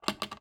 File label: Household Drawer Handle Rattle